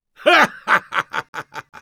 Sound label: laugh happy, es; Engineer